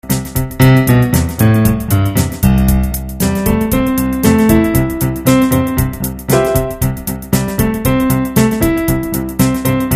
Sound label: piano C